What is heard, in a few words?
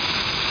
flare fiz; Deep Fighter